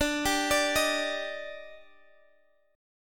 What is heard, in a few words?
Eb Major 7th D